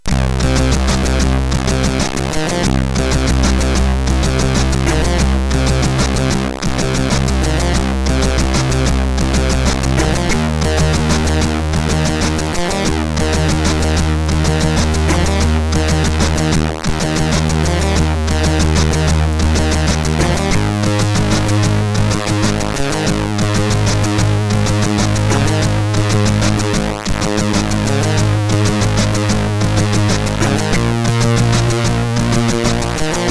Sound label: Basmus, MOS6581R3; hvsc